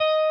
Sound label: Arp.